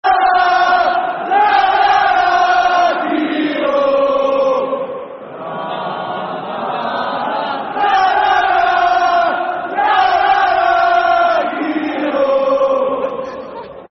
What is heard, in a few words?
Na Nanana, Football Chant (Soccer Song); Sunderland; Na Nanana Keano; Sunderland Safc